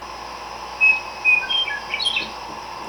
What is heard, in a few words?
meadow lark song; Bird